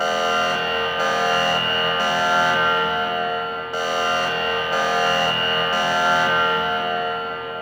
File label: Alarm Loop; Hub Two; Gameplay; Warframe; Zariman